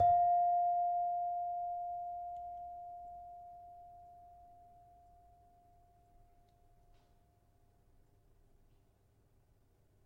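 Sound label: Saron F5, gravity harps tuned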